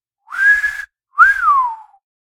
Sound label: Wolf whistle whistling at an attractive person catcalling